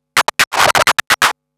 Scratch Fills 140BPM

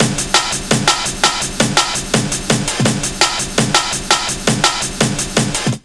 LOOP, Explosive Jungle

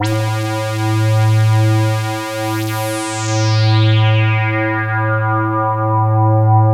LUCKY SWP, SYNTH; Keith Emerson Signature Series

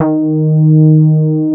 SB HORN; Wizoo - Powered Nord; STEREO LAYER

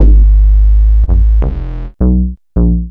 Bass, Futurist Drum & Bass

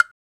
RSS PERC

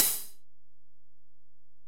HiHat, Alesis DM-PRO

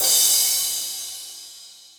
kit, Yamaha Motif-ES8

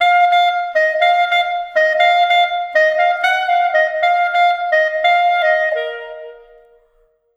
Clarinet Effect; Sonic Foundry (Sony Creative Software); Jazz Solos and Sections; Effected

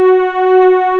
MEMOOG STRN, Analog Odyssey; E-MU Formula 4000 Series Vol. 3

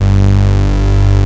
Moog modular; Greytsounds VOL-1

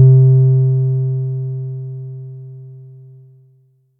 Bass SIN H, Yamaha DTXpress misc voices

